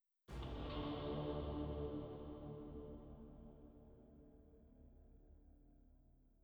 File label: ambience; Netease; gank